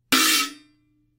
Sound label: choke crash, zildjian